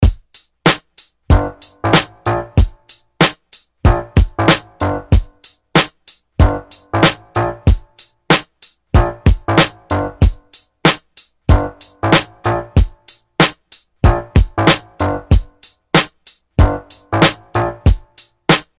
Break

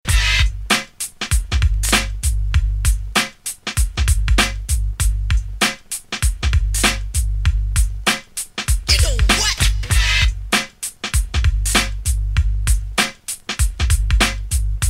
Break

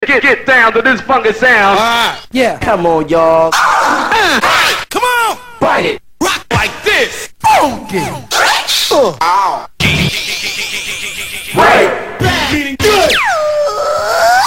Scratch tools

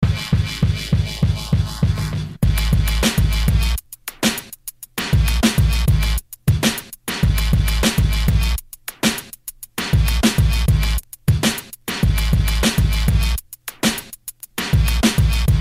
Break

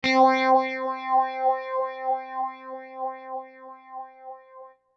Auto Wah

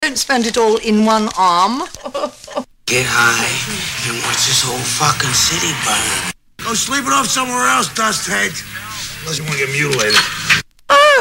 Scratch tools

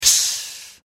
CYM

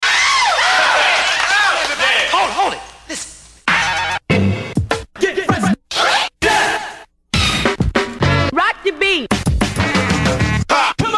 Scratch tools

